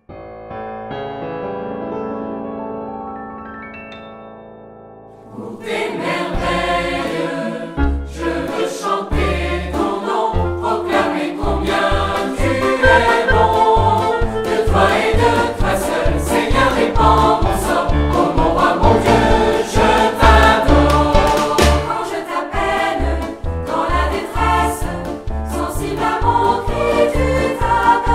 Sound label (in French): Pour tes merveilles, Chants de veillée et de louange; Emmanuel Music